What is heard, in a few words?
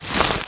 CROUCH